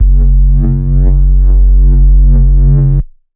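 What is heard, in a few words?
synth bass slow oscillator; multisampled; synth keys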